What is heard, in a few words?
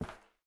Step Wood; miside demo windows gamerip 2023